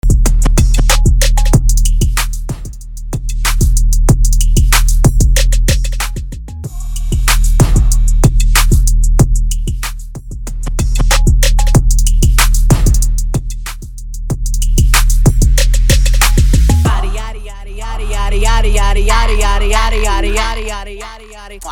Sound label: intro acapella bass 94bpm; remixer from Nicaragua